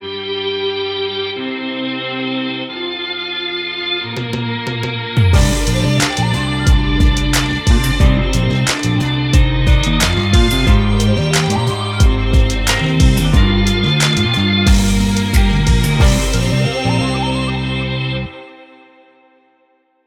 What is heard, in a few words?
mellotron boombap; logic pro